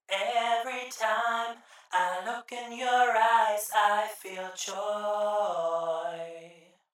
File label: Vocals Phone It In Duet